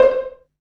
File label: PIZZ, Dance Mega Synth